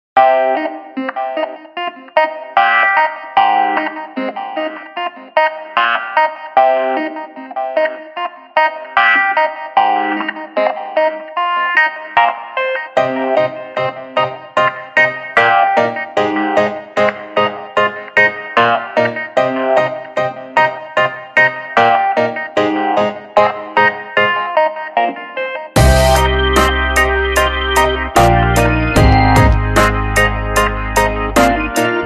chill beats 70; Vinyl Vibes Sunset Groove

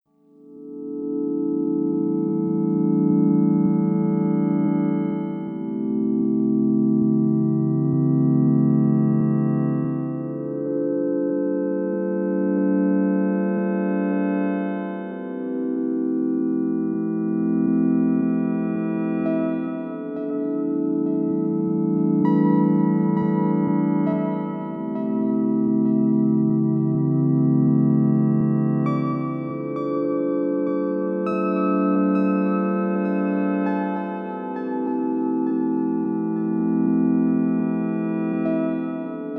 magic music ambient 432 hz; magic sense

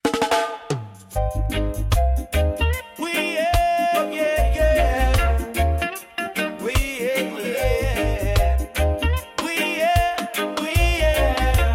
Reggae Beach

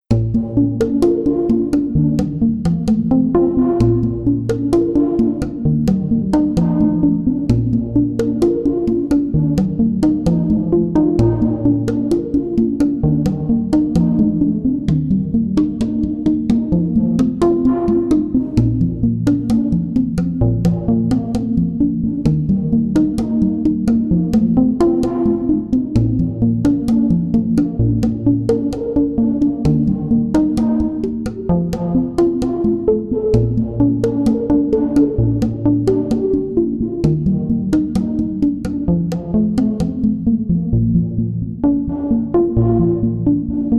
wild sounds 432 Hz; Dziki dźwięk; Czakroterapia

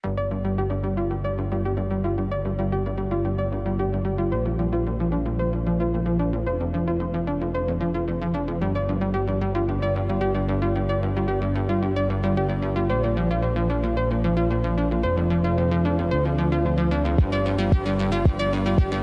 Electronic Impulse

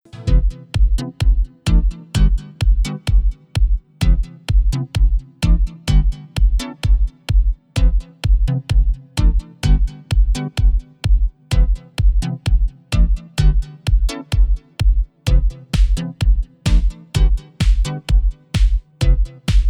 aerobic dance 432 hz; 128 BPM

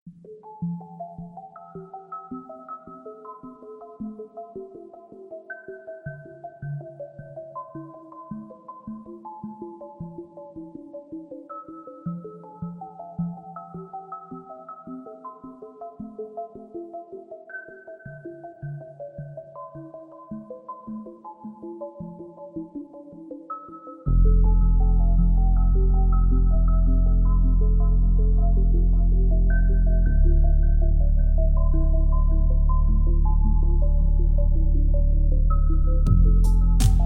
chillout music mix, 432 Hz chillout music; music in action

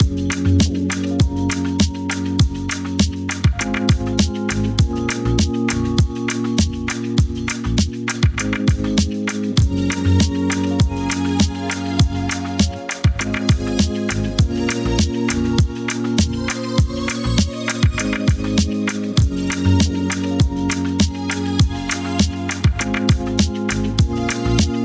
Rhythmical Funk Vibes

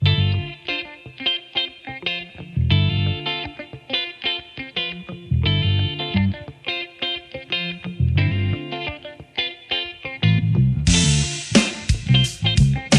chillout singing dance 432 HZ

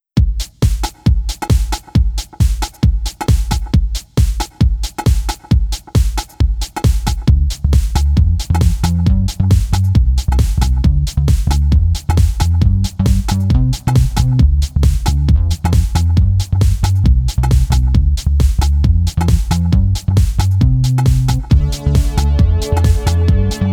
432 Hz, Dynamiczna kompozycja dźwięków; get power

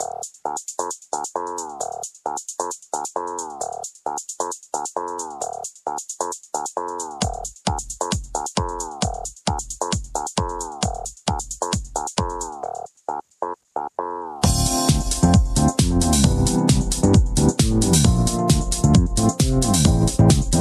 fitness beat warm up

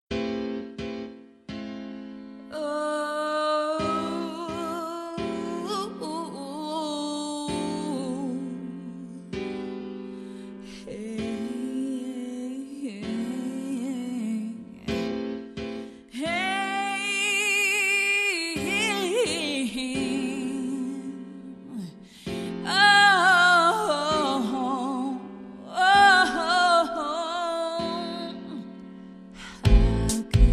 vocally 432 hz

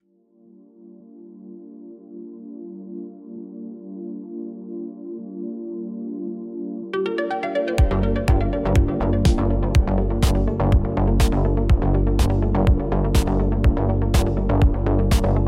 Electronic Impulse

